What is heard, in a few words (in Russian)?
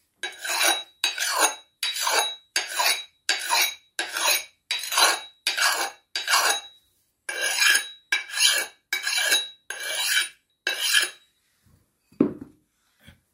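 точат топор с другой стороны; В лесу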